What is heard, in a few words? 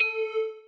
lead flute hybrid a4; bg lead flute hybrid a4; gamerip; lofi room demo